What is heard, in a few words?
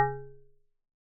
synth